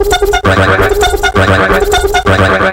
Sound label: CARTOON, Hard & Loud Techno; USB Soundscan vol.01; AKAI